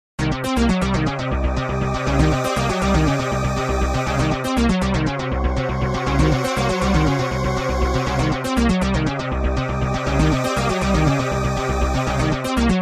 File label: Organ - Goa